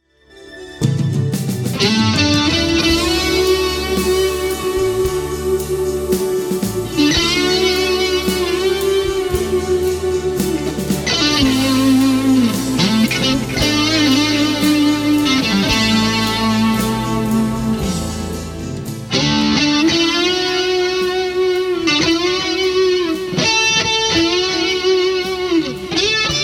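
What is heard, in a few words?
Solo Vintage Purples, guitar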